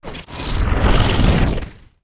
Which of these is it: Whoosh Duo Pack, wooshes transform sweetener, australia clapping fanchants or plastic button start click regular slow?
wooshes transform sweetener